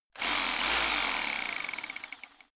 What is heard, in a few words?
rock drill chisel burst rev up; schrauben drehen hydraulik